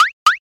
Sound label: Blink Twice; Blastwave FX